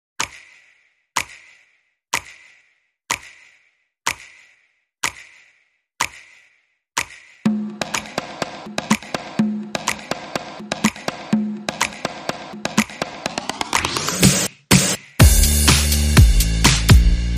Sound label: Rhythm